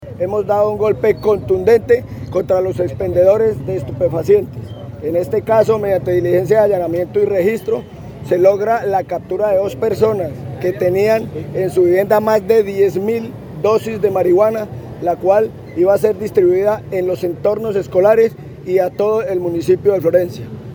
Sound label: MARIMBA